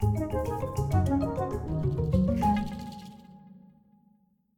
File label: gate jingle; Toki Tori Collection